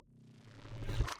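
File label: Timer Turn-On Reverse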